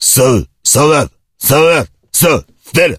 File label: zombie attack; monsters